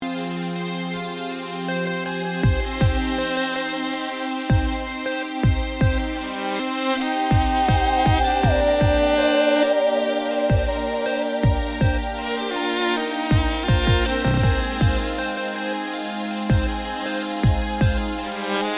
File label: chillout mix